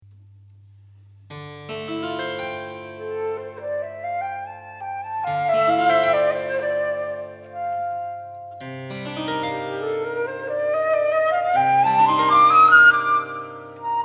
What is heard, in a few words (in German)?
Floete cembalo